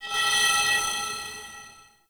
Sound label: Ship Metal One Off; Warframe; Corpus Ship Remaster